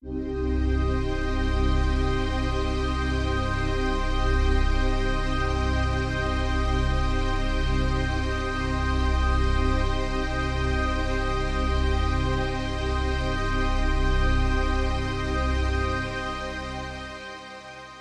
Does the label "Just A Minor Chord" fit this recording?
Yes